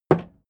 hammer wood

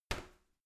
garbage container light lid shut

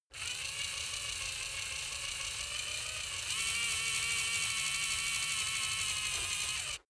servo move high

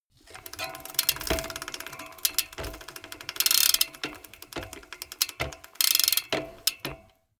bmx bike freewheel reverse click

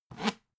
dvd case pick up

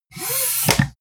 pneumatic open

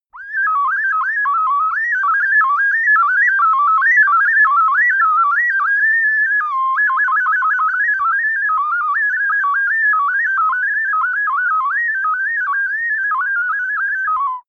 ocarina randomness long

